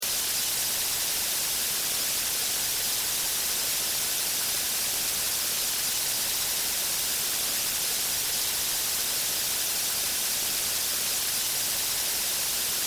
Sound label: Science Fiction HUD Static Monitor Loop; Communications